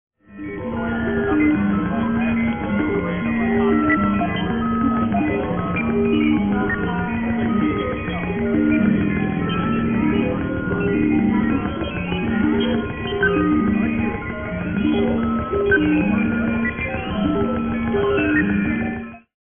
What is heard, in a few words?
orch